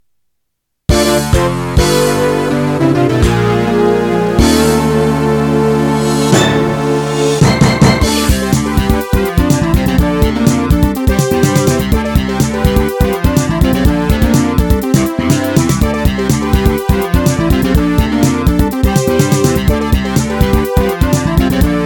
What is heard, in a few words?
casio GM; vanpire